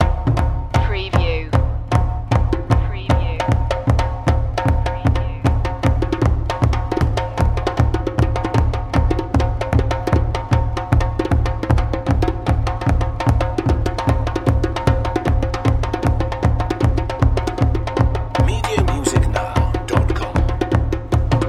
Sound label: african drums lipango loop